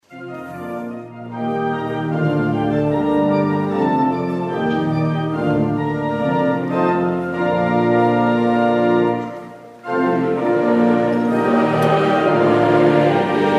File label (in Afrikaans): Gemeentesang